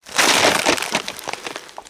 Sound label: ice Shatter; blobAndConquer; combat